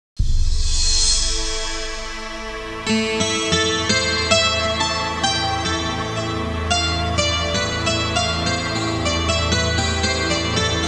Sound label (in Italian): suonerie contemporanea; musica contemporary